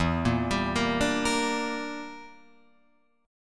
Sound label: E Minor 7th Flat 5th, Guitar, Open F; Em7b5 arpeggiated